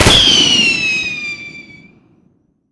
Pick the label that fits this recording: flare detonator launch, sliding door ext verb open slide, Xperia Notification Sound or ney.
flare detonator launch